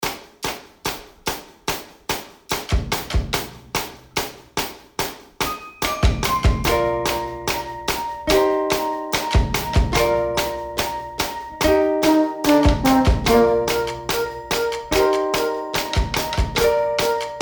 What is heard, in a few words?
Clapping Contest